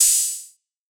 Open Hihat; Roommate; Cymatics; PHARAOH Beta Pack; Cymbals

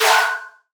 Simple Gravity Switch FX Am6 Gliss Up; Video Game; Cymatics; Video Game Sample Pack Vol 1

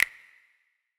Wet Snap; Cymatics; PHARAOH Beta Pack; Pharaoh Premium Drum Samples (BETA)

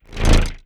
Vibes D; Tones

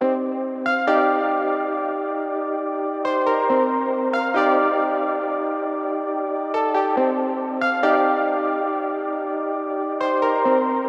138 BPM C Min Prophet 5 Chords; Loops; Loop Stems; Cymatics; Fantasy Synth Sample Pack; Afterlife

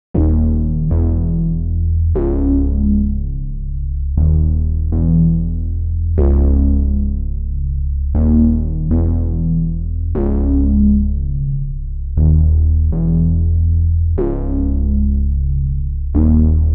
bass, 120 BPM C# Minor; Cymatics; Neptune

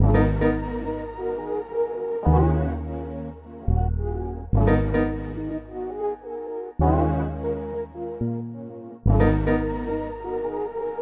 106 BPM D# Min, Melody Loops - Afrobeat; Morning Dance

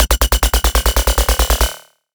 Bass F#; Dubstep; Bass One Shots; Stabbing3; Ghosthack; Dubstep Sound Arsenal; 50 Bass One Shots

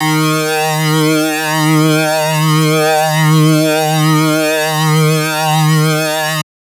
Lead Shot D# UFO, Dubstep; One Shots; Ghosthack; Dubstep Sound Arsenal

